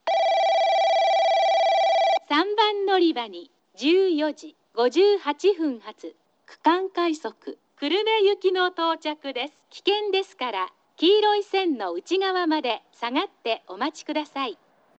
yoshizuka regional rapid kurume; 3番のりば接近放送（区間快速 久留米行き）; 吉塚

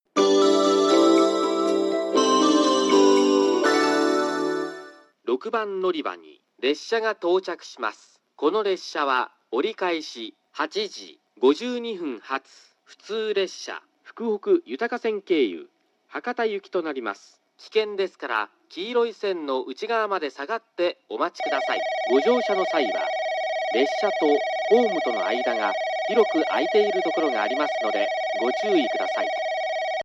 local Hakata; kokura

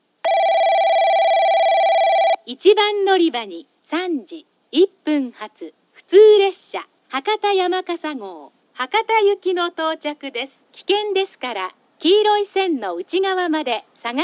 Hakata yamakasa; 黒崎駅 接近放送（博多行き）

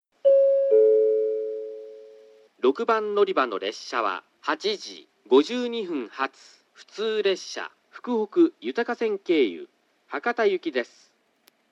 local Hakata; kokura

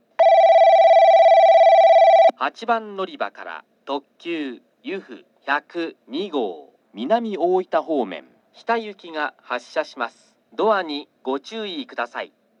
oita yufu hita

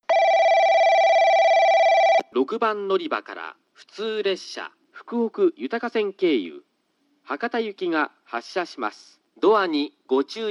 local Hakata; kokura

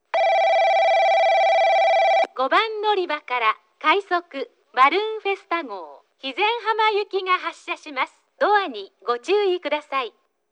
balloon Tosu rapid Hizen Hama

